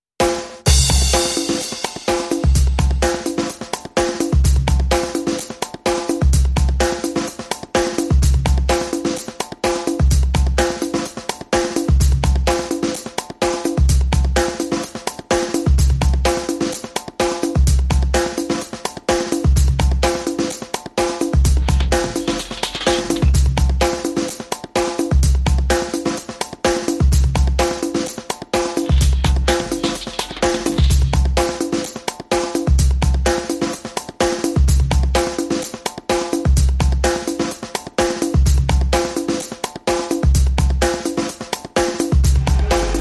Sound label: Drums and Perc Only